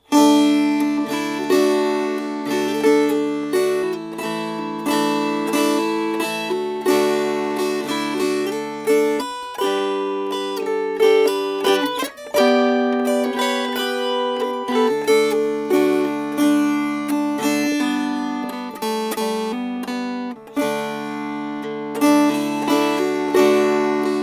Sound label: Sad Am I, And Sorrow Laden Strummed